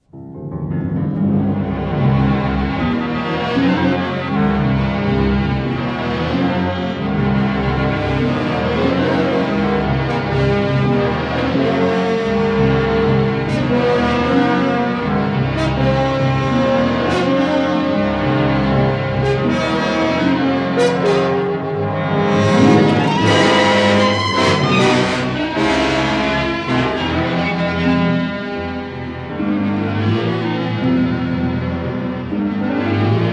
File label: sound challenged locks